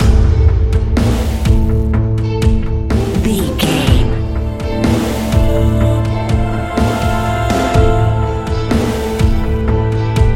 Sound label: A cool piece of electronic music